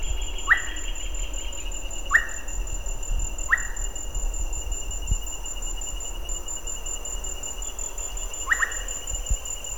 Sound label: Chamaeza campanisoma single calls